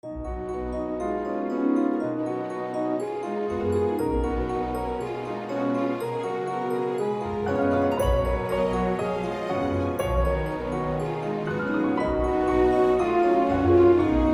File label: Cinematic Radio Intro